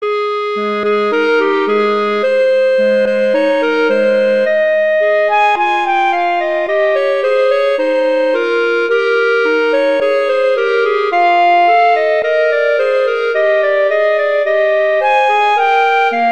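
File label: Duet, horn; Mozart Duets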